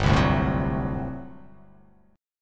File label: G Major 7th Suspended 2nd Suspended 4th G down